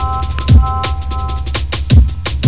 loop

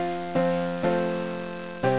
Sea Cliff Program error

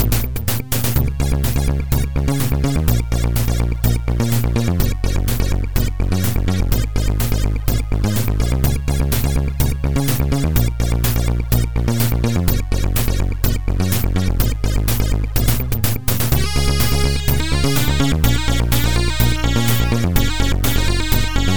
SCALES

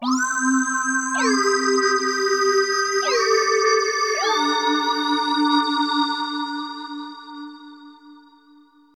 SYNTH PAD AMBNT 160 C, Ambient; eJay; Sphere

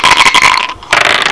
dice roll; Knockoff

